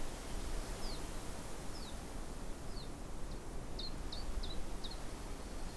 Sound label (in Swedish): vrango call; Lockläte; Sonogram Artportalen